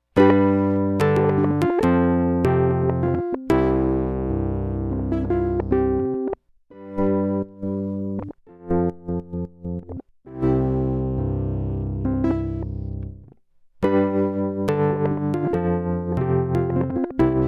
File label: hohner pianet